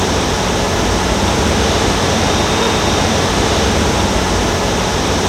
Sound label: ATMO, Transwaves; Soundengine - CSR-000 The Art of Transwaves